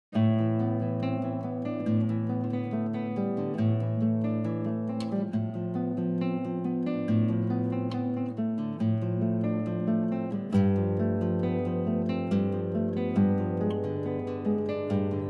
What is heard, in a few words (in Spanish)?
Fandango por Bulerias